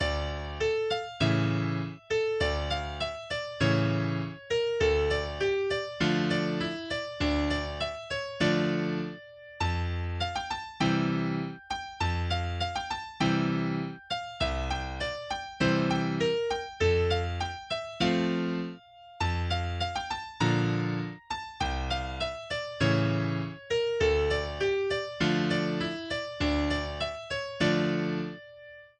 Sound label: Windsor Tarras, english dance tunes; eighty english dance tunes